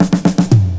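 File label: drum roll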